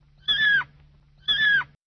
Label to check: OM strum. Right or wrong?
Wrong